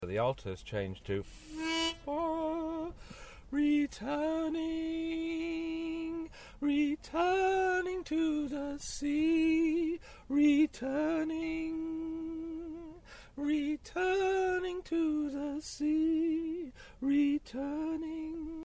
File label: Alto; Parts for ending section [B]